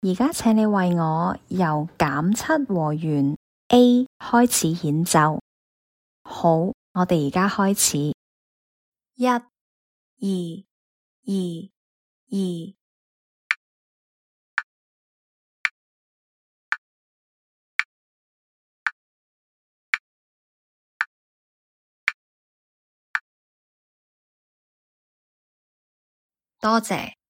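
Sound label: diminished 7th starting A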